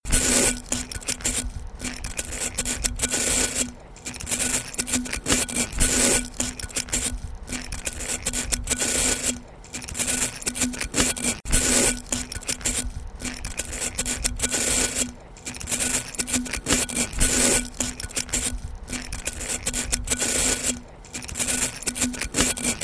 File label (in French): Brosse contre bouteille